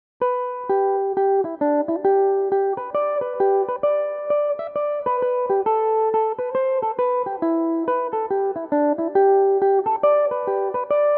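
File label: G major, Irish mandolin; my darling fair maid; belmuse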